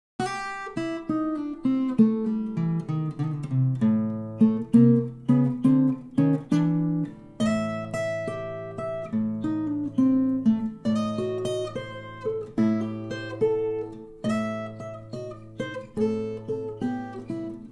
elementary blues

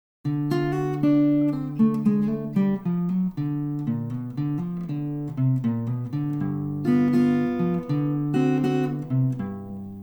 black cat blues

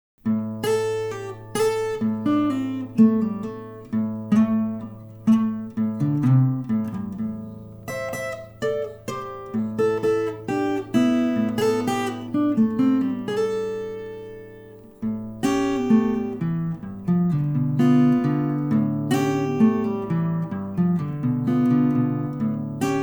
blues

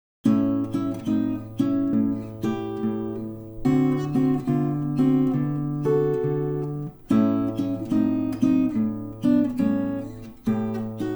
blues

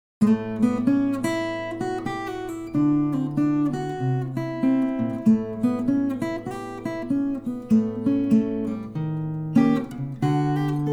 blues

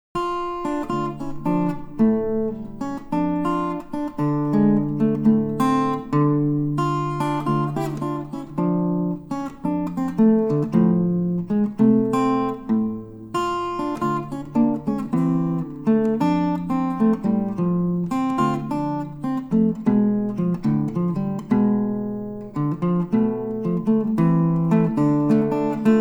blues

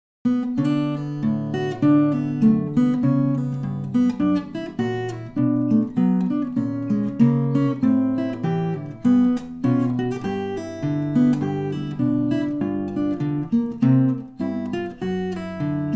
blues

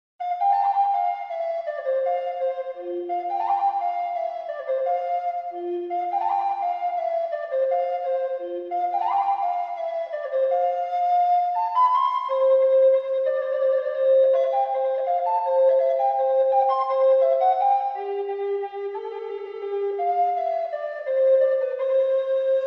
fantasy presto; telemann

